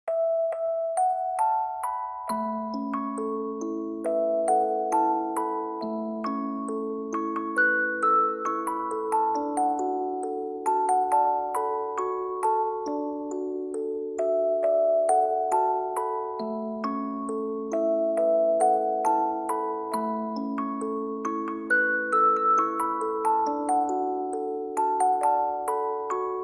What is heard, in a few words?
Music Box